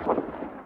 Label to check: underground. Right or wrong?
Right